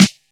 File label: subby acoustic snare sample f sharp key